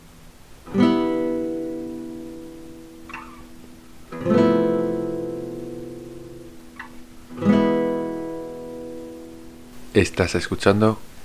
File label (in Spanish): acordes